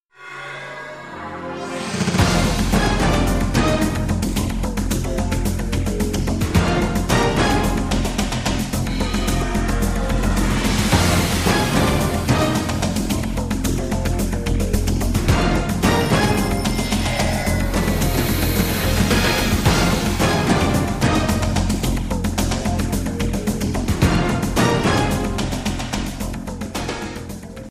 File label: audio jingle